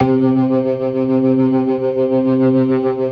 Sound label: ORGAN, 4 DRAWBARS; Keith Emerson Signature Series